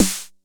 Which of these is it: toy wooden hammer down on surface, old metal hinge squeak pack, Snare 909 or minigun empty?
Snare 909